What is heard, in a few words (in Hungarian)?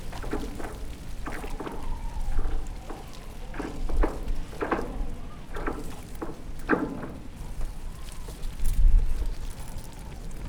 arbocrudon futyulo szel; lanzarote 2017; arrecife